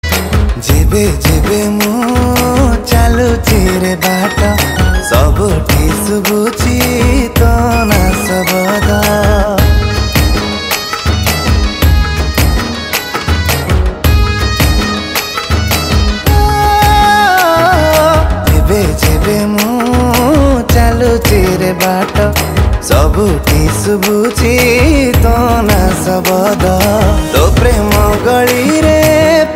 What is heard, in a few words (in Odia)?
Odia Romantic Ringtone; Romantic song